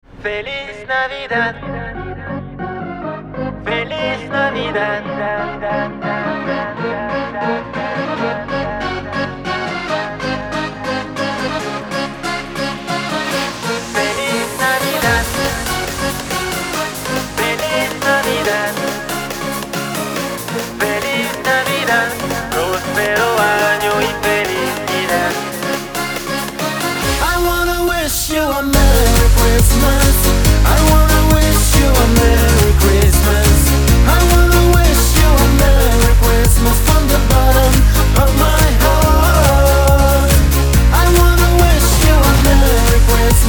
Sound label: Pop Mix